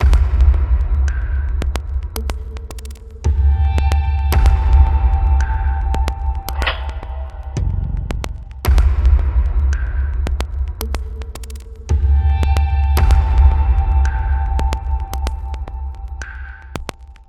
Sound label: Bgm Ambient Intro; assault spy windows gamerip 2018